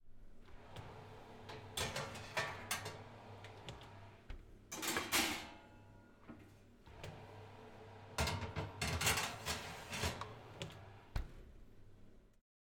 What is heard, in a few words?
Taking Oven Pan Out And Putting It Back Into Oven